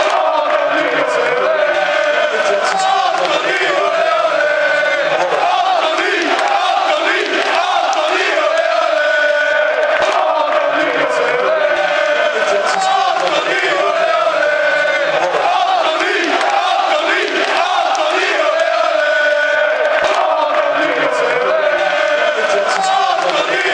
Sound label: anthony ole ole, fan chants